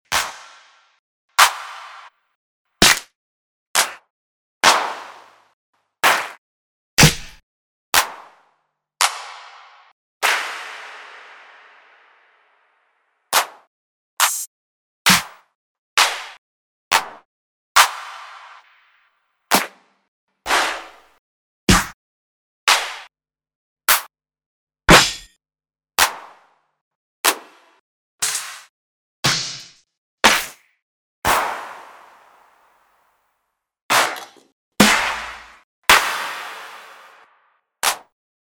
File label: club claps